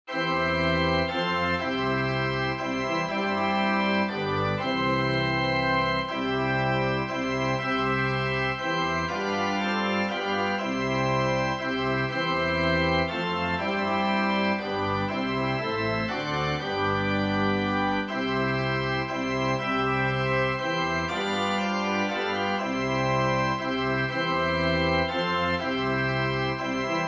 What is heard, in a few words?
In the Cross of Christ Organ